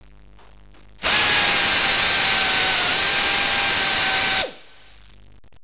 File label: drill